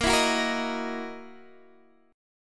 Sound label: Gb 13th Bb, guitar